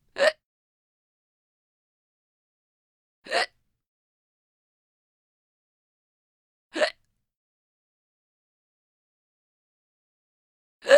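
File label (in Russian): икает девушка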